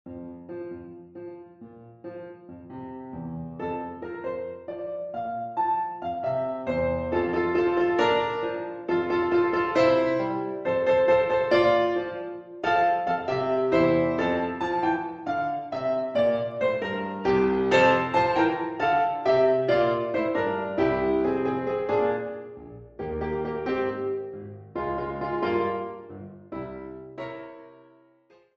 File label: Minimal Mambo